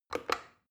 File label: plastic button start click regular fast